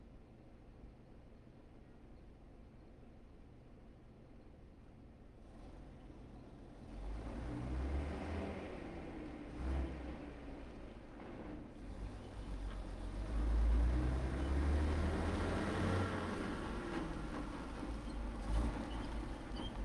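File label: exterior passby; m1 corbitt; dpa4011; fvtt sonniss gdc audio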